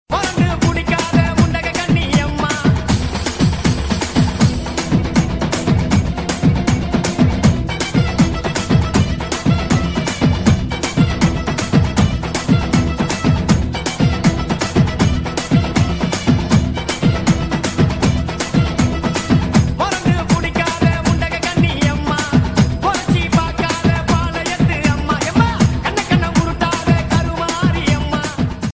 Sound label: iphone tamil urumi ringtone